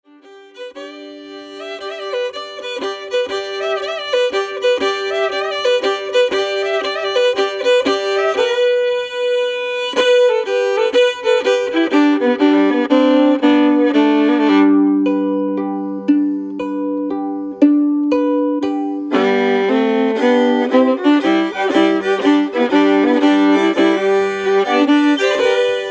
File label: Old Cajun Waltz